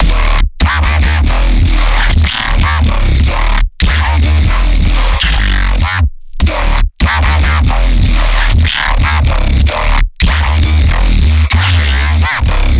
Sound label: growl loop, bass